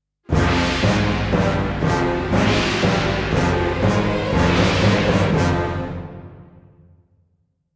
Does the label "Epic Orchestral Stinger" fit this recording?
Yes